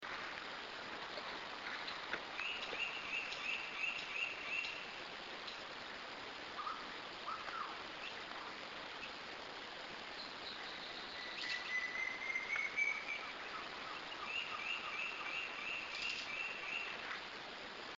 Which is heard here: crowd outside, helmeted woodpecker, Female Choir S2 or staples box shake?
helmeted woodpecker